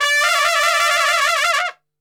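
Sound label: D trumpet shake; Best Service; Brass Super Section; TRP SHAKE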